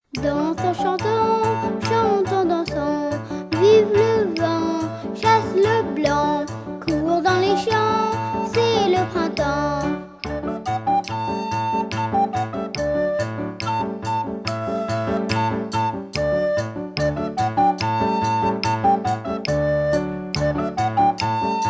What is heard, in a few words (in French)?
Danse en chantant